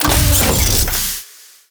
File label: Door Large Open; Ambience; Warframe; TheNewWar; Zariman